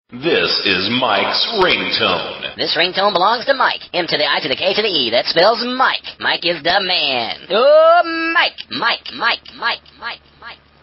ringtone

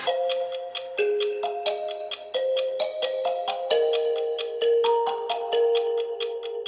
clock notification

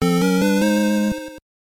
Receive Item; LOZ2

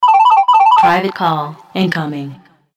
incoming call

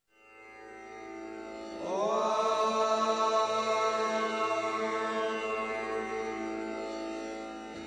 om chant; ringtones

